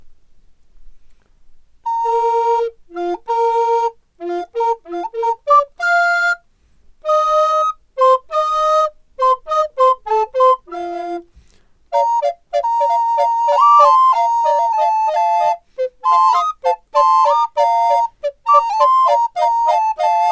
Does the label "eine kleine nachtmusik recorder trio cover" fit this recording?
Yes